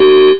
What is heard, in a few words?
beep, rave